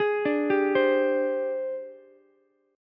Ab Major 7th Flat 5th Ab, down, Ukulele; arpeggiated; JGuitar

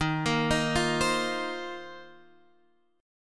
Bb Suspended 2nd Suspended 4th, chord

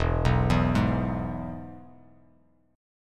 E-Major E; arpeggiated; JGuitar

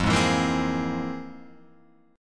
F Minor Major 11th, Guitar, Open F; strummed; JGuitar

